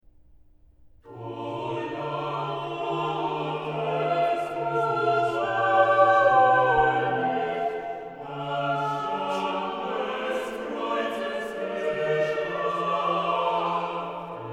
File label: Chorale, organ; BWV0401; O Lamm Gottes unschuldig; BACH; BACH ORGAN WORKS; CHORALE PRELUDES